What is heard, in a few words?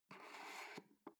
foot switch dimmer slide up slow